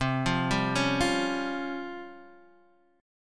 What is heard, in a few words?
Db Major 7th Flat 5th C